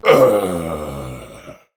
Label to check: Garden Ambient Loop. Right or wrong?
Wrong